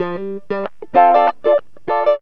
GTR, CHANK; Zero-G; Funky Elements

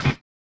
collision; Spectrasonics; Metamorphosis

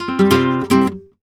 Flamenco Guitar Frase; Best Service ProSamples vol.46 - Flamenco

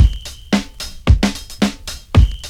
BEAT, Beats 106-111bpm, Hip Hop; E-MU Formula 4000 Series Vol. 1; Hip Hop Nation

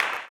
CLAP, Blues Soul; Spectrasonics Vocal Planet

